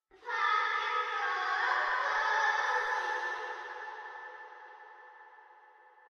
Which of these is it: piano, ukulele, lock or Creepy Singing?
Creepy Singing